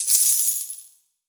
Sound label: Poker Coin Drop; D4DJ Groovy Mix; Android, iOS, Mobile